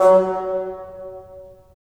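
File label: wind bassoon; LA Composer vol. 3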